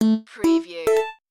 Casino Slot Machine Bassy

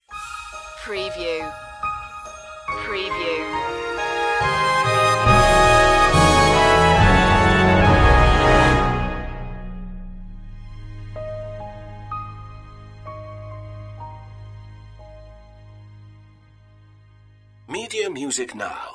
cinematic introduction

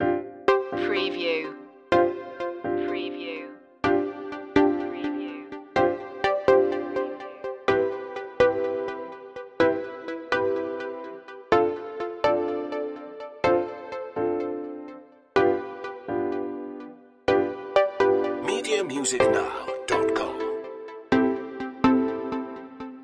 carefree day out loop

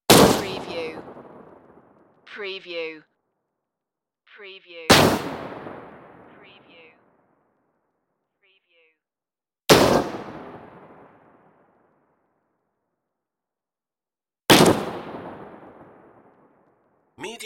dirty sci-fi gunshots

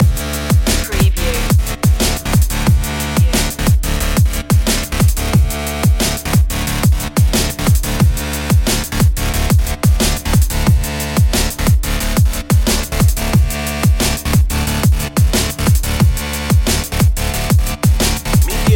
droid shuffle loop

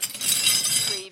Drag Tools

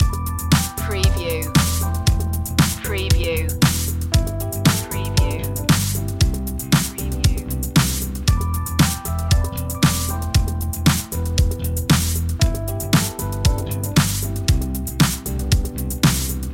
daft funk loop

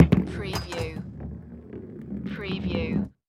Ski Ball Game Roll; sound effects bible

